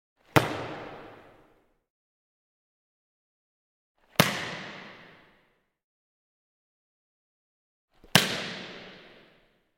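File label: volleyball sounds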